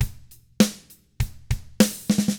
ROCK, Drums

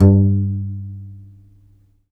C. Bass Balalaika, Plucked Strings

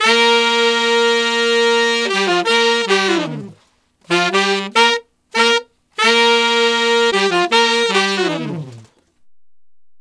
Sax Section in Bb, Jazz; Sonic Foundry (Sony Creative Software); Jazz Solos and Sections

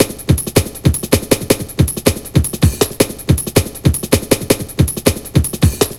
160, Drum Loops; Total Drum&Bass

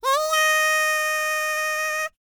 BULGARIAN, World Voices, BALKAN; ILIO; Vocal Planet

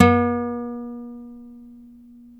NYL NV C, nylon no vib guitars; Spectrasonics; Hans Zimmer Guitars VOL-1

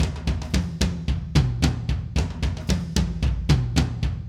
Acoustic Live Drum; Platinum Audiolab

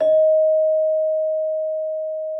Celesta D#5; Xsample VOL-8

